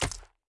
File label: Enemy Clicker Run